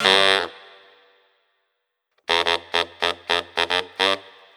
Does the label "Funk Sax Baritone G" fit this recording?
Yes